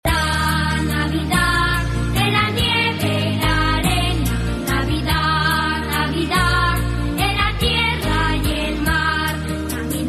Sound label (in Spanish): navidad ringtones navidad